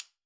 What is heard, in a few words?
Steel Drum Sticks B4, Calypso Steel Drum; Percussion